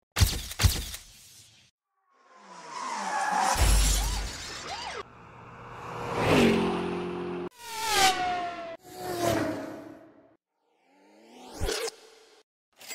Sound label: fx transition pack